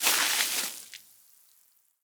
waterbucket splash; Rust

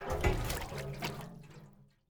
water jug throw water; Rust

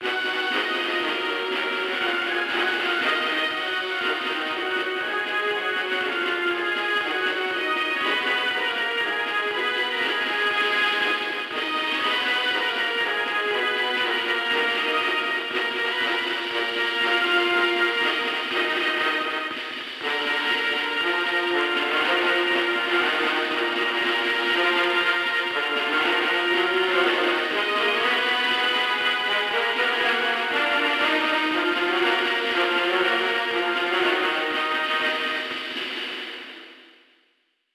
tune; World of Tanks Blitz; hangar 9 may

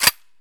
mpx bolt in; Escape from Tarkov

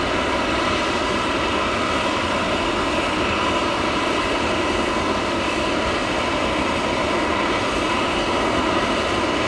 Su-27 Cockpit Su27 Around Engine In; Aircrafts; DCS

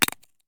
grenade collision flash russian; Escape from Tarkov

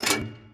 gun reload; World of Tanks

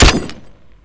bmp 30mm metal

